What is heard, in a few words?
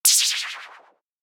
Whoosh Simple High